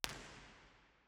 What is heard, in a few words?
First IR Sala 2800 Genelec Center Binaural; Santa Cecilia Roma; Measurements 2005; Soundfield